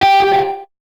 Guitar Note

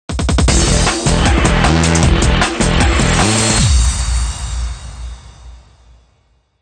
Music Game General event

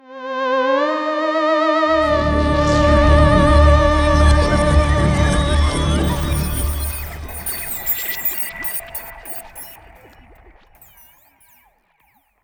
Round Start; Moon

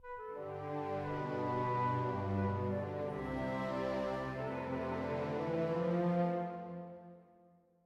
Stinger

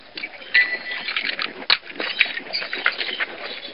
SFX CHAIN

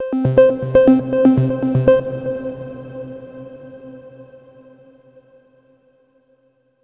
Overlay Tone; Act3 Downtown

